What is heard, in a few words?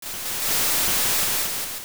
shore; Zelda